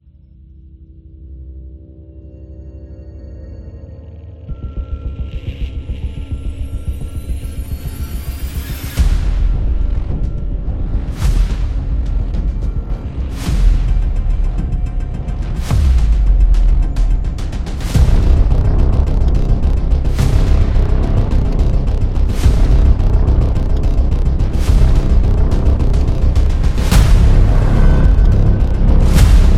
Suspense Intro; mystery

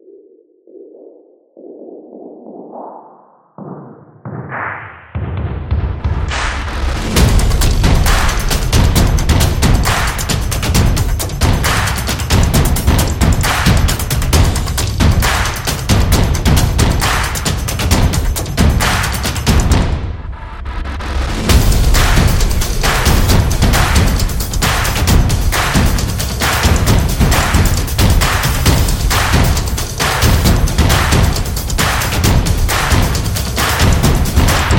Sport Stadium Drums

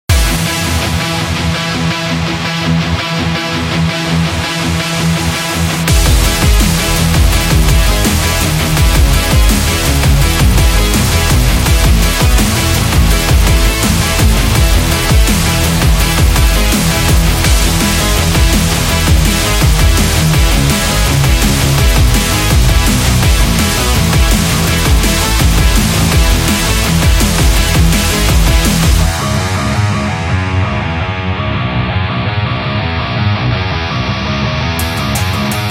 drum and bass metal

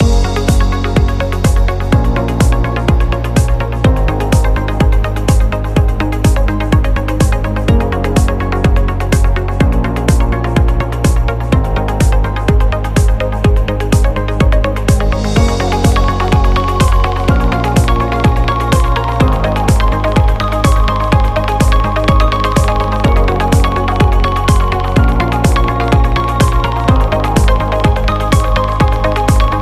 Real Estate Loop Corporate

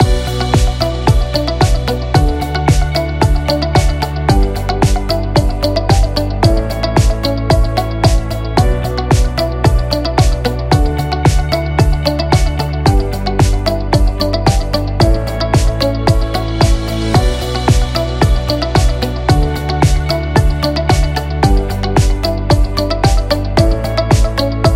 Minimal Loop

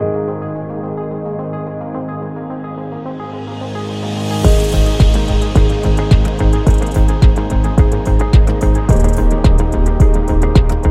Corporate Identity; explainer

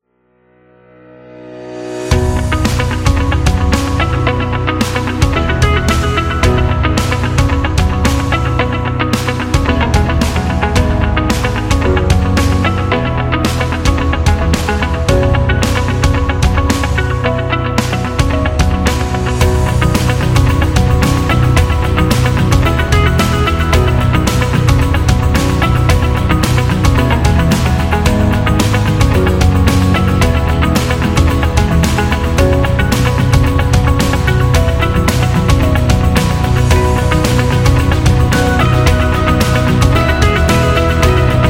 Dynamic; explainer

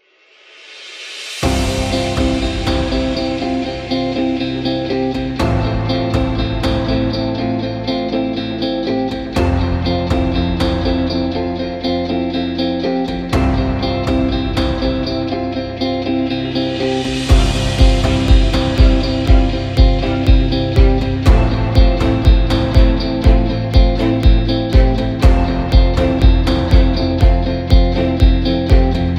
A Motivational

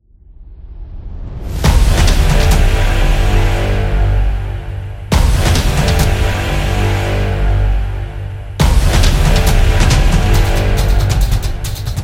Promo Epic

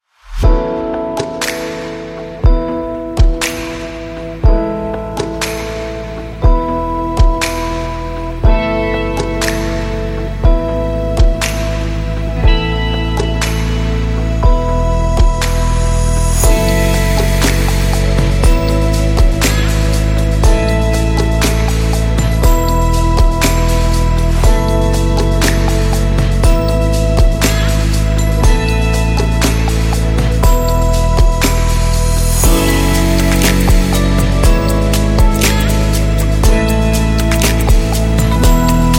Ambient Calm Abstract; ambience electronic music

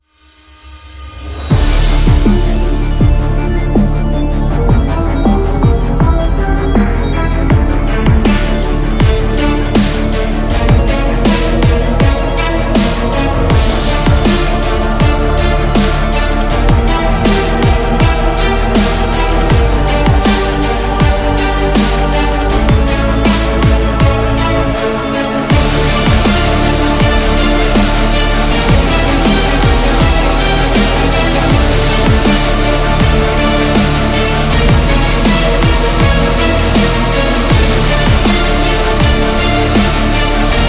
Epic Dubstep; cinematic action

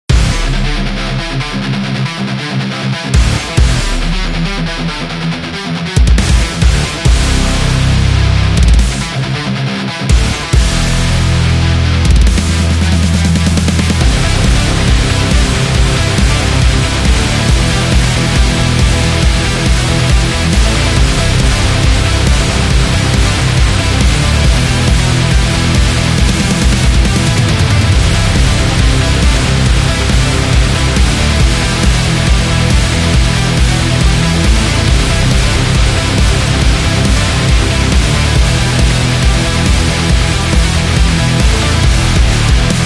The Metal

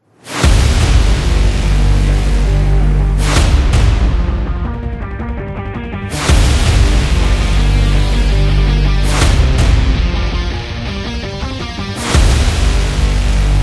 Action Extreme Trailer

